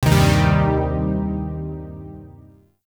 Shawzin Pent Min Chord D; Warframe; Zariman Shawzin; Chords

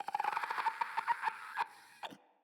Infested Runner Patrol

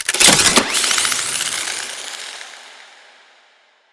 Attach Bolt; Vor; Warframe; Tutorial; Cinematics

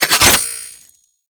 Glaive Equip; Warframe; Tenno; Teshin

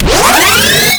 Puzzle Switch Shock Warning; Warframe; Missions; Raid; Grn Puzzle Switch

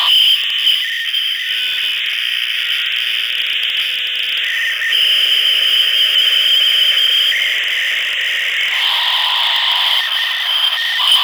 Static Feedback Loop; Shadowgrapher; Warframe

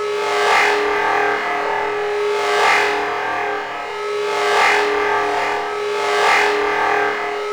Spin Beam Loop; Enemies; Weapons; Warframe; Tau; Sentient Dactolyst

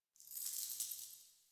Idle Head Shake; Tagfer

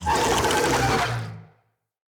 Infested Big Ashen Vocal Attack

